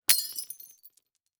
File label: Crash Beer Bottle Drop And Break; crashes; AdobeCC